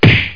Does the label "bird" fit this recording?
No